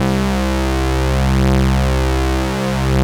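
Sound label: PPG synth brass, PPG Wave 1; Greytsounds VOL-1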